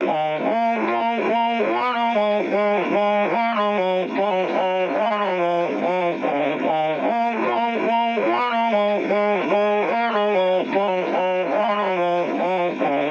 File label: Vocals